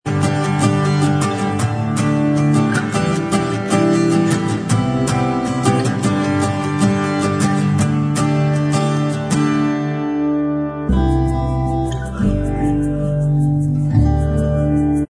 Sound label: acoustic